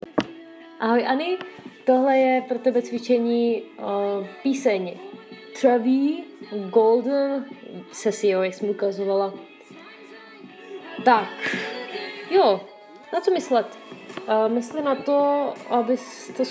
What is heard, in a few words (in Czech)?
intonace a plny hlas